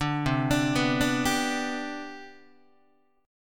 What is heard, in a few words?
C Major 7th Suspended 2nd C down Guitar, chord; CM7sus2 arpeggiated; 5,8,5,9,6,6; JGuitar